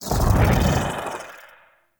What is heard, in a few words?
Operator Wraith Void Enter; Focus; Operators; Powers; Wraith Movement